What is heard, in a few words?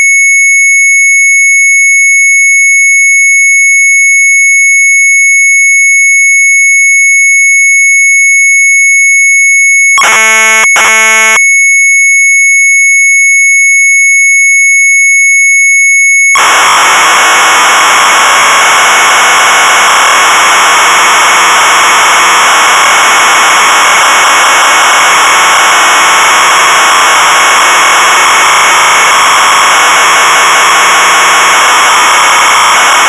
MZ800 Tape, sharp; BEETLE; retromelsarchive